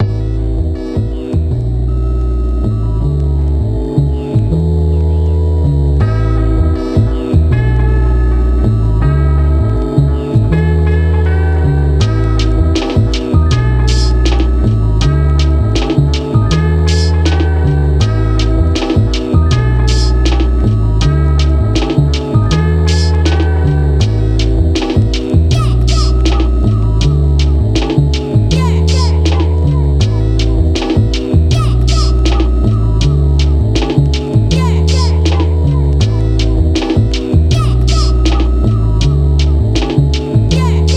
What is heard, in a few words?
Drumless